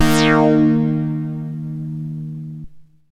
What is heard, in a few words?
MASS LEAD, SYNTH; Keith Emerson Signature Series